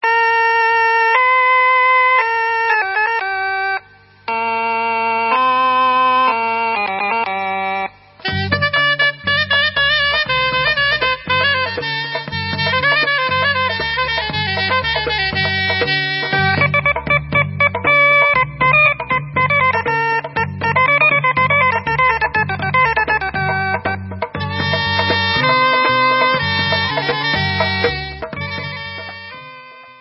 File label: Debka Instrumental